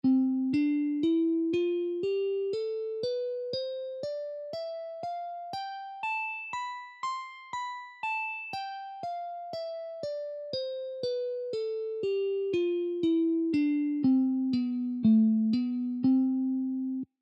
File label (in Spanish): escala de do mayor posición 3